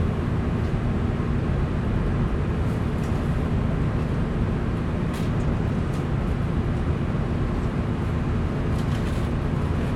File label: Bus; Apple; iOS-26; HearingUtilities